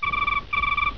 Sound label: Game Bird; FishingBass